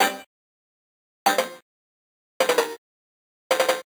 Arcade Click Negative

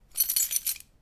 Water Canal Key

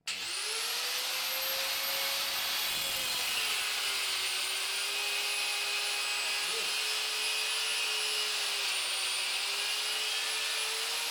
pipe saw; devices accessories utilities; NS Studios - ultra sound collection

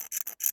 Dentist Plaque, Medical; Blastwave

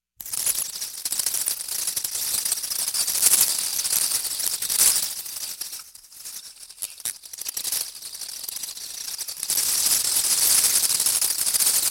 Vampire Bats Chittering; horror sounds; Hollywood Edge

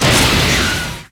Rocket Launcher Shot; SFX Kit; Weapons

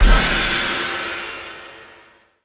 Pickup Magic; SFX Kit; Pickups

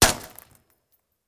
Bullet Ricochet; Sound Ideas; Guns

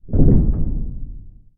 DOOR Sci-Fi Heavy Metal Close; mono; Universal Sound FX; DOORS & GATES

